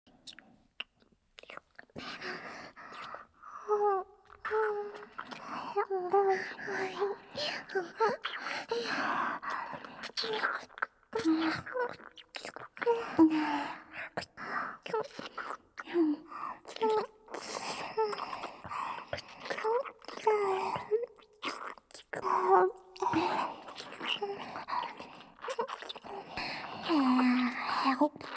べろちゅ ループ

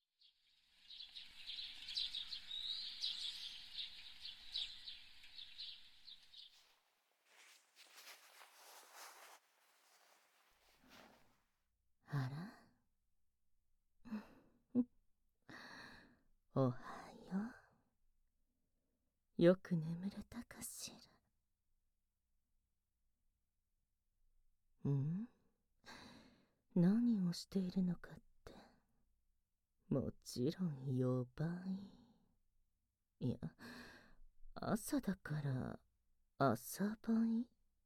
モーニング耳舐め乳首責め焦らし

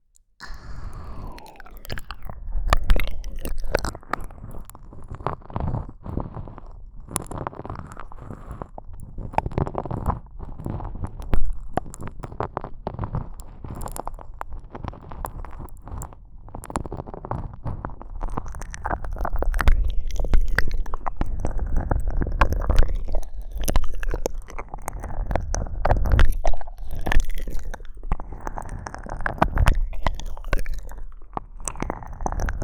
ゆっくり耳奥舐めコース

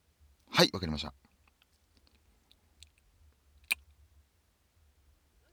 リップ音

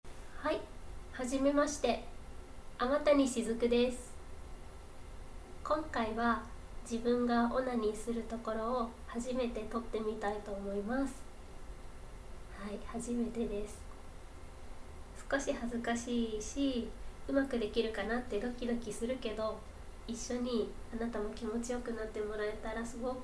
初ガチ実演オナニー; THE FIRST ORGASM

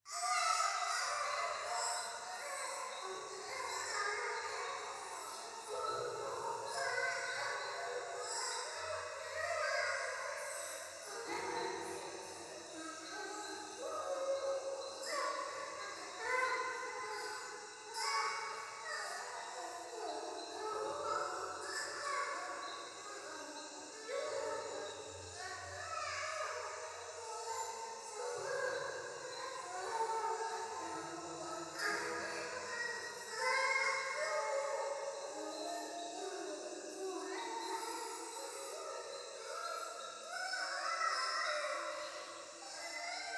教会 シャブ喘ぎ 山奥夜

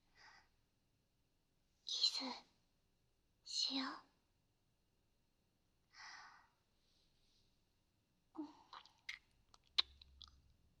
キス; SEなし

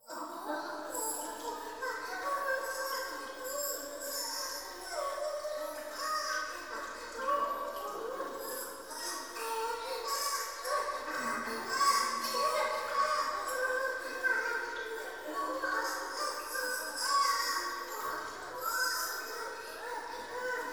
トイレ 低音喘ぎ 雨上がり

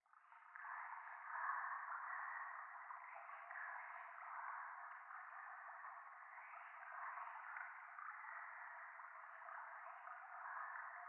廃墟 しこしこ 川のせせらぎ

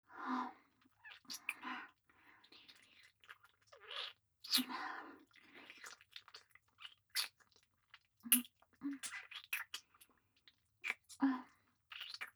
正面近い・ディープキス・普通; ミーシャ

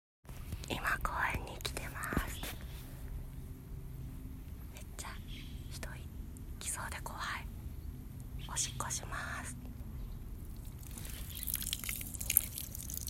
屋外でおしっこ

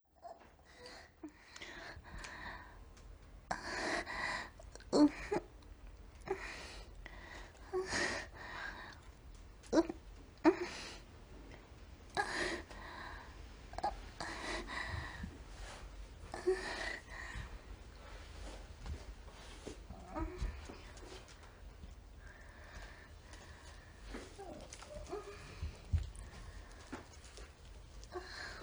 初めてマンコにバイブをぶち込まれた時の美少女の喘ぎ声

